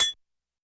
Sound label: Glass Noize; YAMAHA RM1X